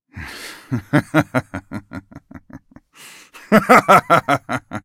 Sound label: laugh; Yasuo